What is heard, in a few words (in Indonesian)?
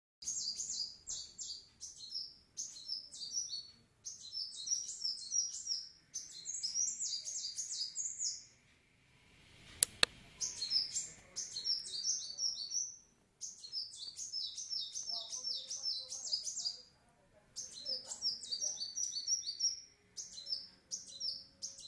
suara burung kolibri